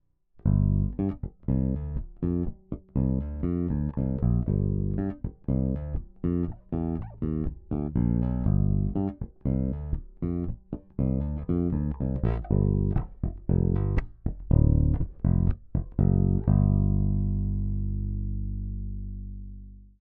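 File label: passive neck; Ibanez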